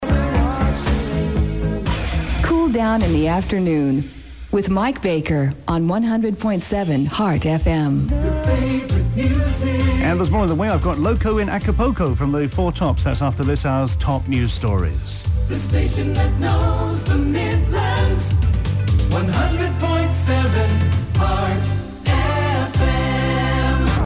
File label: jingle; heartfm